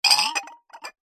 household glasses drinking x2 pick up together clink; zapsplat